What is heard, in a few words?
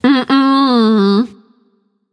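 disagree, female; smite; voicepack; hera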